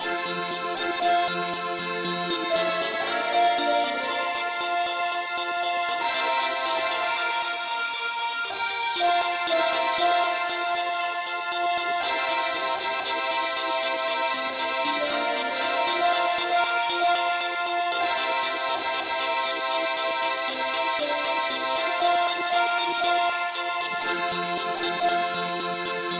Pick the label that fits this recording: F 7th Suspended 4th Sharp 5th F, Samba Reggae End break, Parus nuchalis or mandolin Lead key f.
mandolin Lead key f